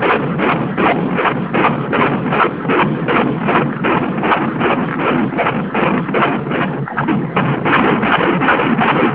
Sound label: baby heartbeat